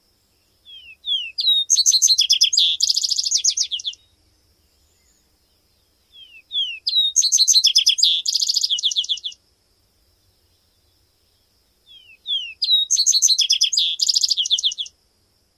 songs

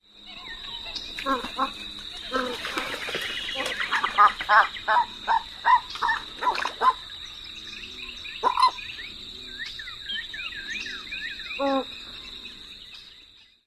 flight calls

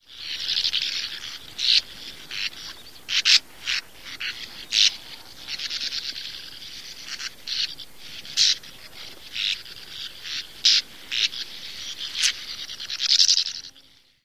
calls of flock

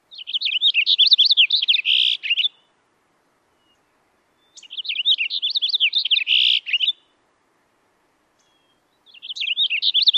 song

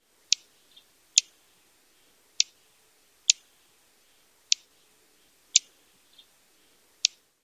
calls

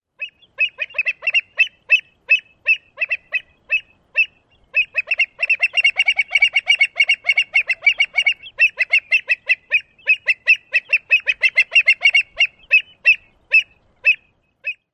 excited calls

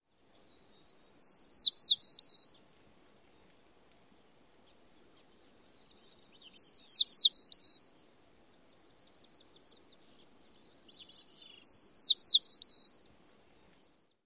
songs

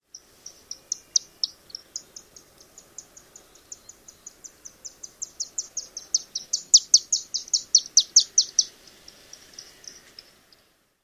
courtship flight calls

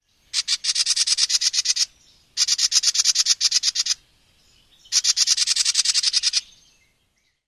harsh rattles; NY

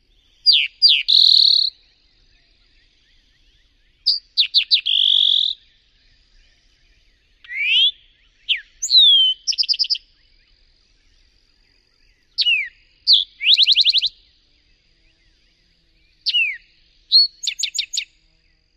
five song types of one male

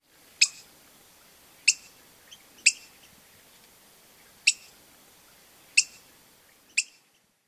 chink calls

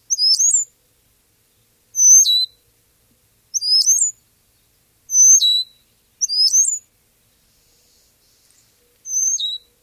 whistle call variants

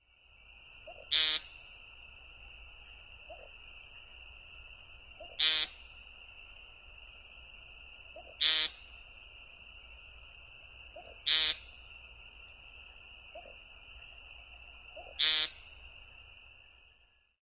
peent calls

